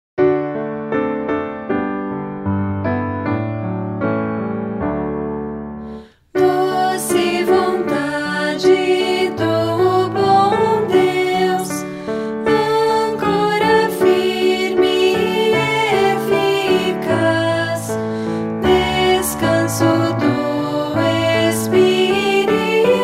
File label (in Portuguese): voz piano